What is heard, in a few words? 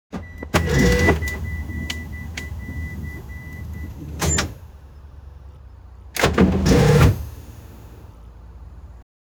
forklift